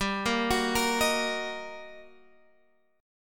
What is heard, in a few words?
G Minor Major 7th Sharp 5th G, Guitar, Half Step Down